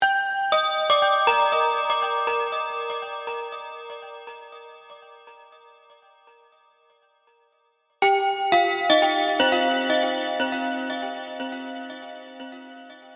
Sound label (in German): das glockenspiel